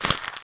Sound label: paper dig; minetest errata